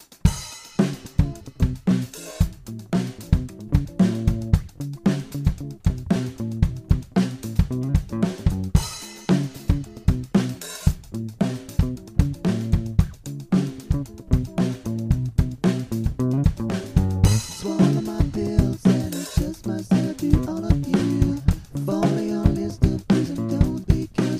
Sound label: groove; ideenkollektion swallowpills